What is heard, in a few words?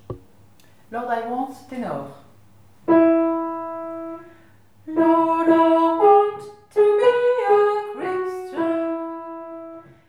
Lord I want Ténor